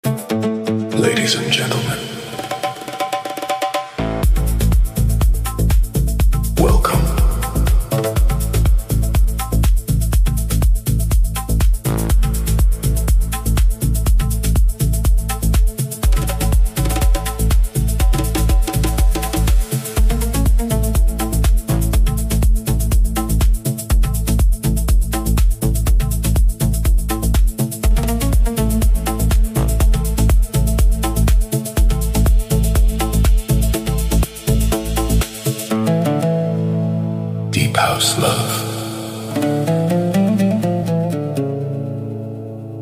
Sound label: luxury progressive afro house mix